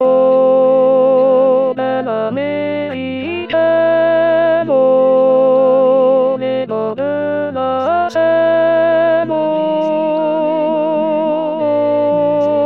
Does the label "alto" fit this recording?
Yes